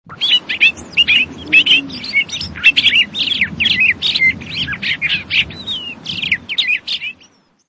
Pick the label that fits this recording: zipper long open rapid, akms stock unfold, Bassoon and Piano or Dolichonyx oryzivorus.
Dolichonyx oryzivorus